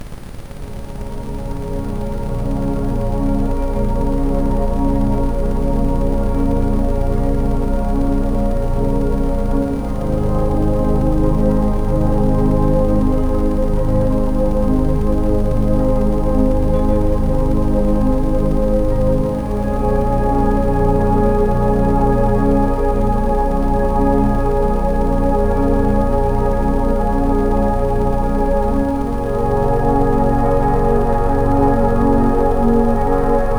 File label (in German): Rife Koerper Boost mit Ambient Sound; rife frequenzen